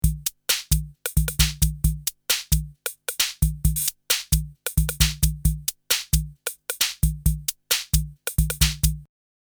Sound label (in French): old school boite a rythme; Loop Mania Sampler Pack